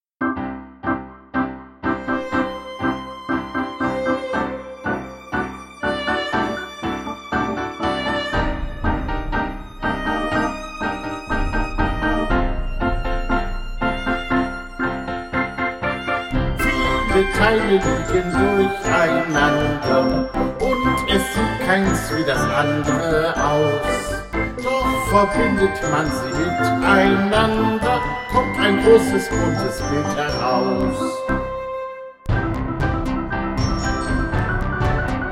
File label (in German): Gesang